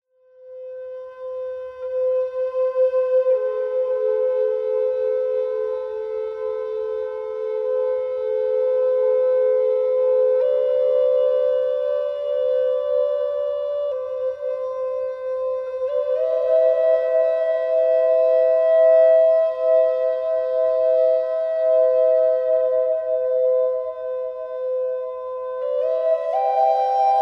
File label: Flute a native